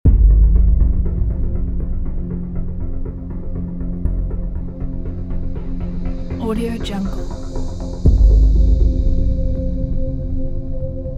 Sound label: cinematic suspense; zbsbrothers